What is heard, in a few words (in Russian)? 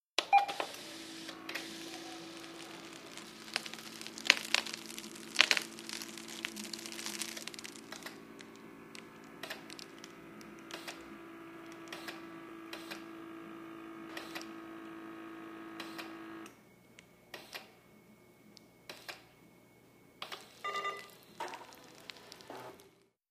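измерение давления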